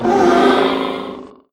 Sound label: die; npc; rtrooper